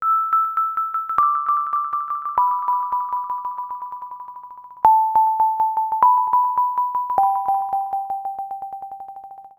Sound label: loop droplet